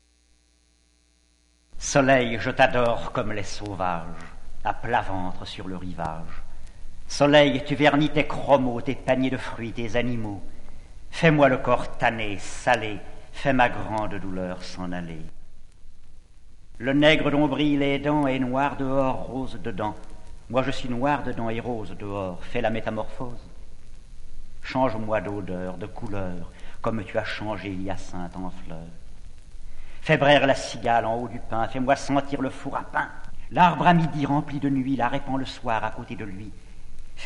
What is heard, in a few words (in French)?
batterie